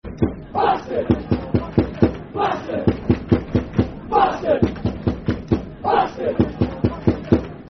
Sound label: Bastard, Football Chant (Soccer Songs); Darlington Dfc